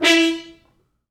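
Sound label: French Horns stops, E3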